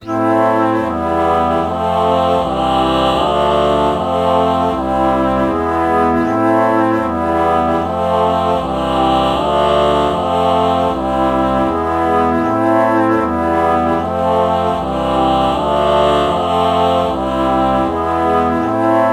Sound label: alto flute ah chord morph